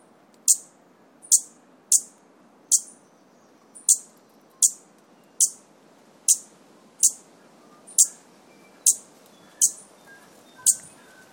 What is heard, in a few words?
flintstrike hihi stitchbird call